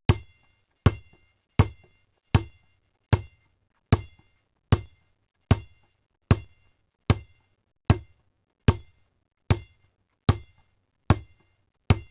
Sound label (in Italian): rimbalzi; rumori